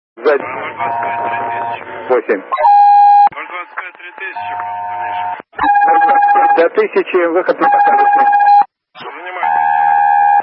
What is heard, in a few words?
bip-bip